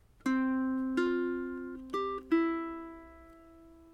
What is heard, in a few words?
sus4, ukulele